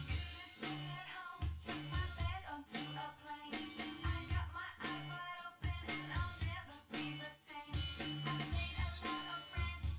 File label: test wdrums synthconv; SiSEC10; underdetermined